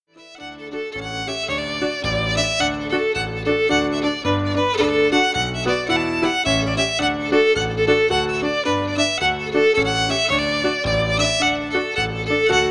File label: Marches